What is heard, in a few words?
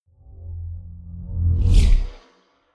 sci-fi vehicle drive by tonal fast; raumschiff